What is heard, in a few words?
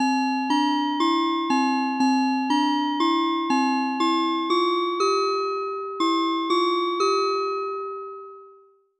harmonize